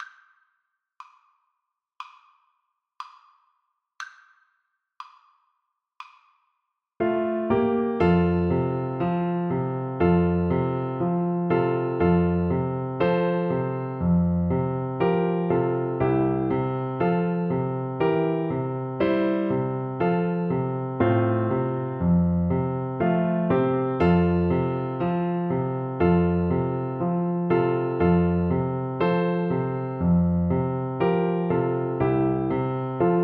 tuba

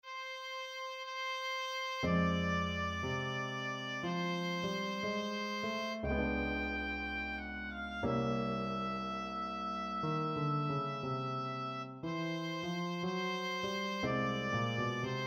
happy jazzy birthday, oboe

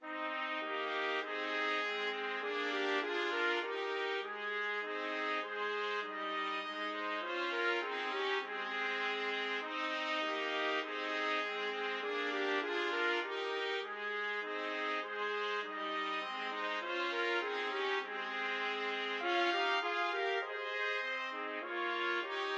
trumpet trio

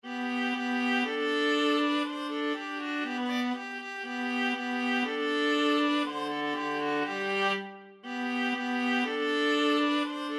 viola duet

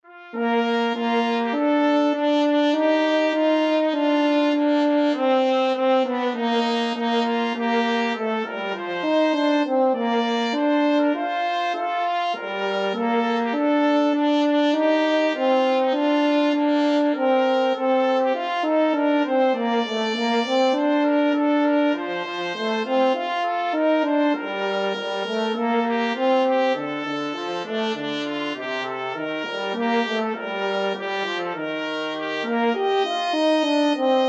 national anthem russia, trumpet french horn duet

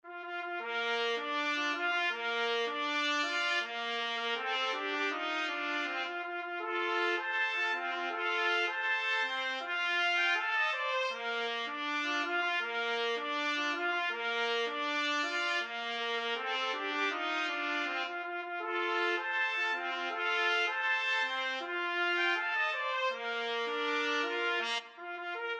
la cucaracha 2 trumpet; trumpet duet

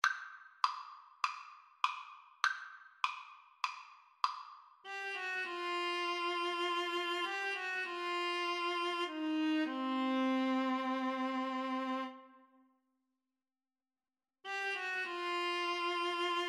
viola, Viola Duet

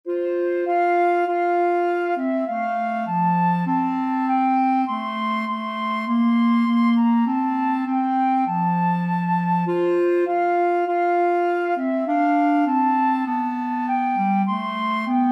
flute clarinet duet

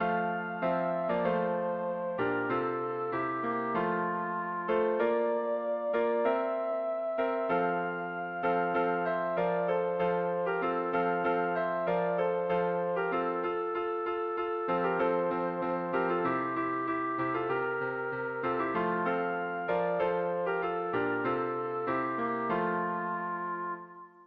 joy to the world, clarinet

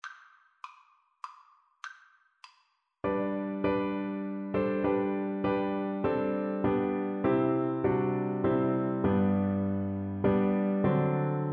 away in a manger, cello

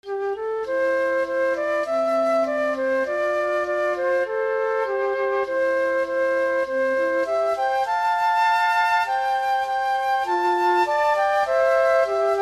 loch lomond, flute duet

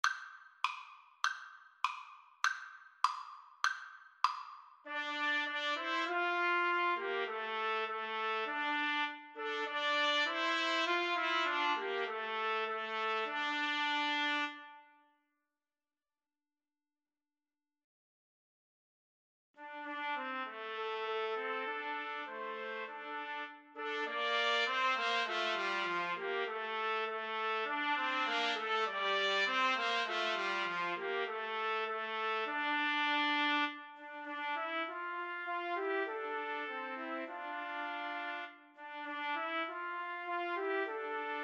Trumpet Trio

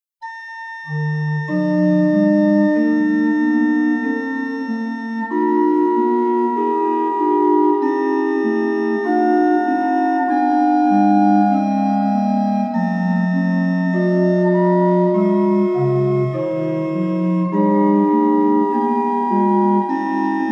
Da Jesus an dem Kreuze stund, organ

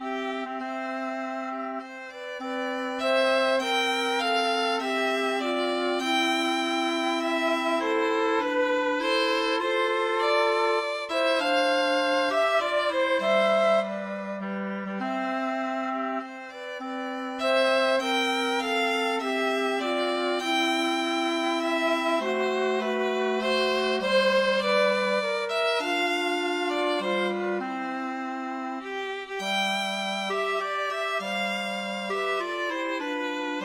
flute clarinet violin

